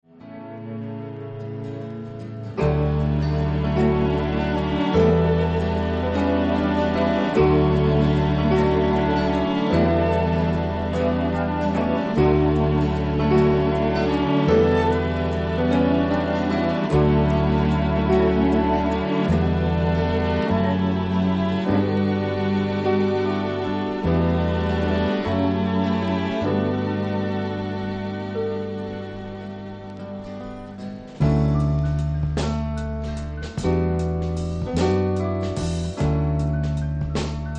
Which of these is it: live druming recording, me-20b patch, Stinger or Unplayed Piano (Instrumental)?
Unplayed Piano (Instrumental)